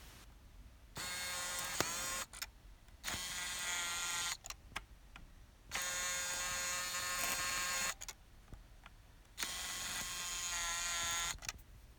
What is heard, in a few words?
Lens Zoom